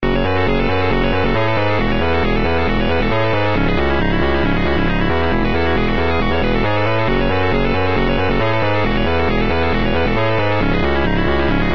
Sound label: minigame theme, music; sven; zombie town